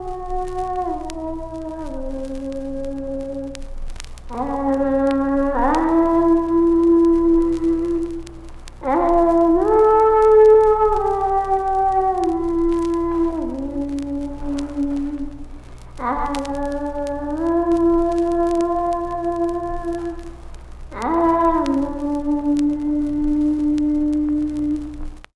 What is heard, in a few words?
little girl moans